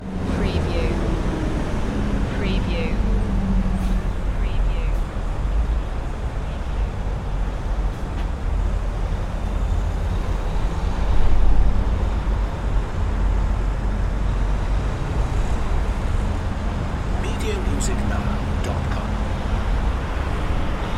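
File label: City Street Ambience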